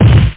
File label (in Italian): Caduta; MtDemo